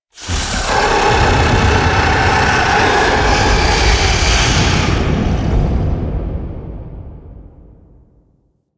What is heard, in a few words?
Octopede Death; Entrati Labs